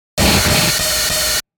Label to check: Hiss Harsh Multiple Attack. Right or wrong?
Right